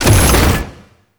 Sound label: standard door open mech; ambience; Warframe; Entrati